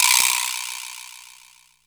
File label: DR-660 Perc, Drums, Boss DR-660